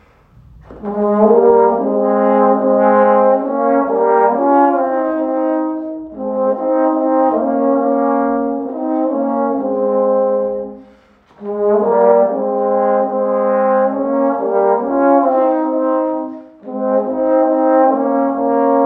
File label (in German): Weisst du wieviel Sternlein stehen Posaune